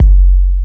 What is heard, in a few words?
Kick